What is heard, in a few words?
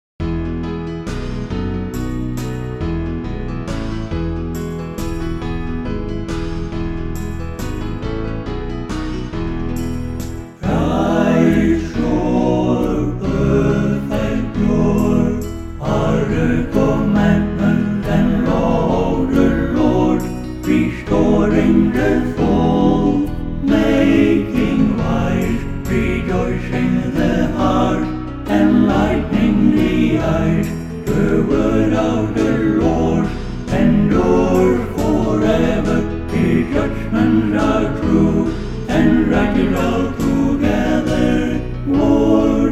SATB; New Hope Music